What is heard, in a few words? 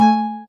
soft hitwhistle; osu